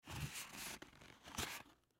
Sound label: Cardboard Box Small; Foley; Cardboard Sound Effects; Materials; Blastwave